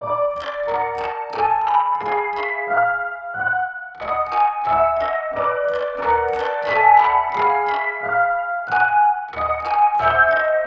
Spooky Setting + Toy Piano 90bpm; Ultimate Everything Music Bundle; Loops for All Occasions

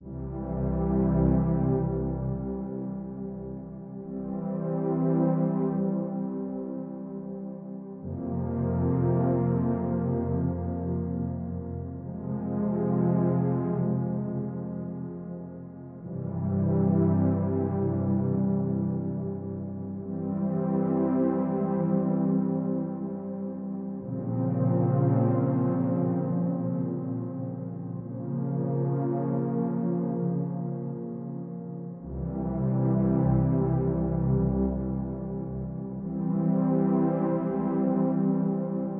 dark synth loop; Cinematic Battle Music

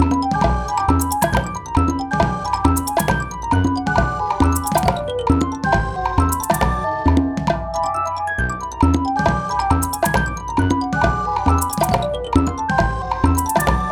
Puzzle Minigame Fun Sequence (modern, 136bpm); Ultimate Everything Music Bundle; Loops for All Occasions

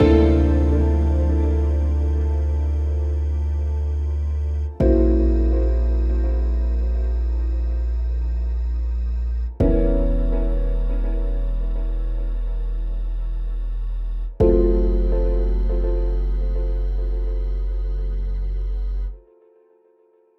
ending, Survival Metroidvania; Hollow Hope

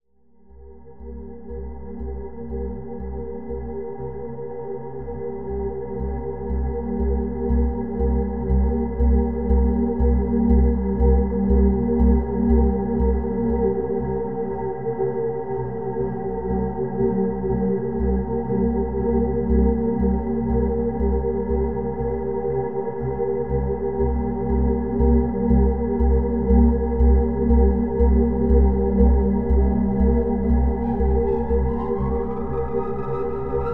Pulsating, True Crime Inspired; True Crime Inspired OST

